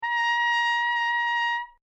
trumpet

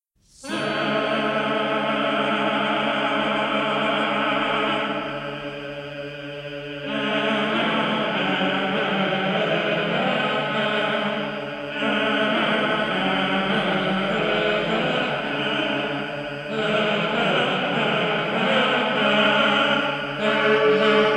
organum; Perotin

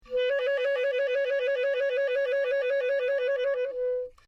clarinet

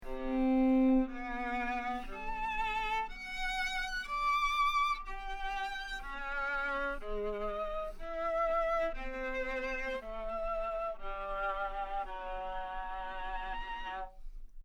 viola

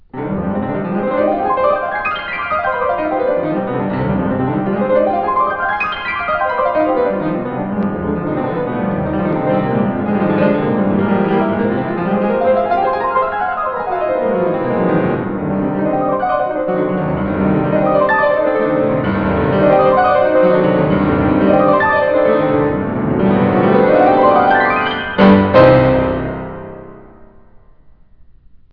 PIANIST

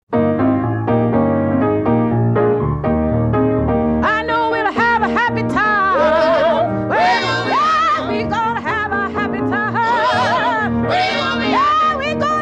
ragtime

